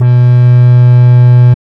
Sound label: TRAXBASS, Hard & Loud Techno; USB Soundscan vol.01; AKAI